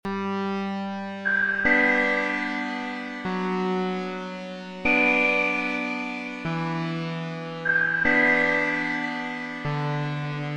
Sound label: space lullaby loop